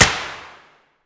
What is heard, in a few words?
mosin indoor silenced distant; Escape from Tarkov